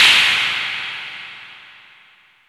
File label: Cymbals